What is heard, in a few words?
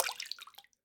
Shell Water